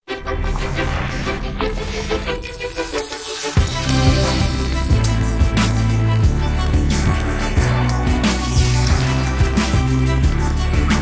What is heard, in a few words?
classical pop